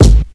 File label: acoustic bass drum one shot c sharp key; kick